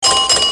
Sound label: collect; slot game; La Gran Aventura